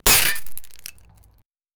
glass cracking impactful

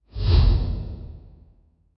gravity flip woosh sci fi

